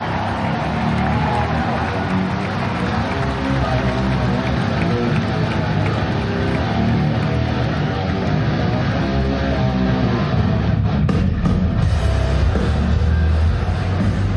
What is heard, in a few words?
Riff